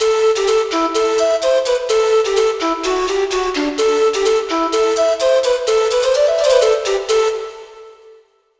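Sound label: Low D Irish Flute, synth; KORG OASYS; oasys assault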